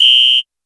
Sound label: whistle; Roland R-70; Perc